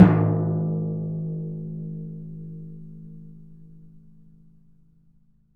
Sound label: TMP A#, Synclavier Percussion